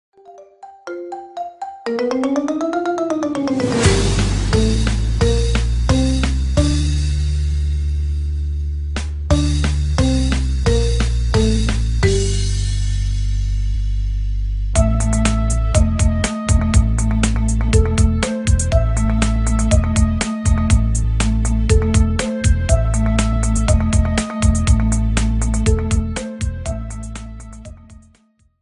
marimba ringtone remix

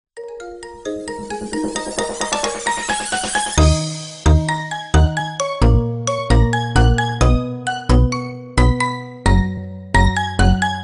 marimba ringtone remix

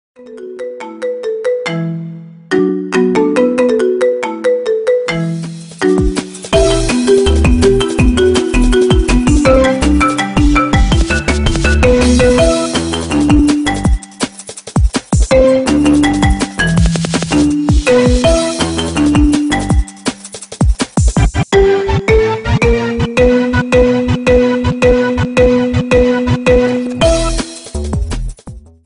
marimba ringtone remix

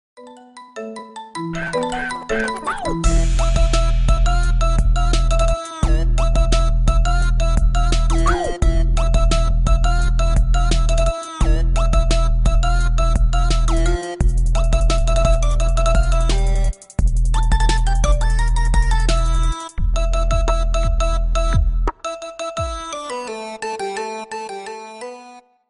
marimba ringtone remix

